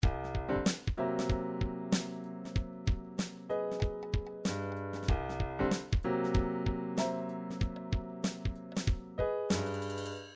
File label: triphop; Newtronic Triphop Construction Kit